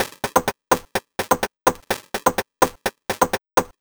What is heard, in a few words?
Hats, Drum Loops 126bpm, Nu Dance Electro; Zero-G; Soundclash Nu Dance Electro; Acidized Wavs; Nitty Gritty